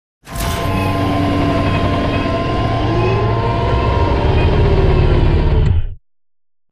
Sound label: Sideways Town Giant Floor Panel Arrive Rotate Lock Servo; Boomtown; Titanfall 2